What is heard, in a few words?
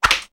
whoosh, Hong Kong Action Kit; NoizBoy; Hit Kit 1